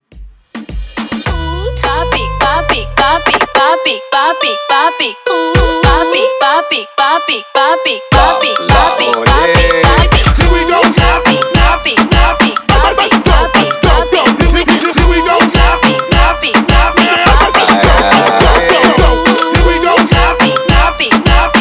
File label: Reggaeton Party Breakz 105 BPM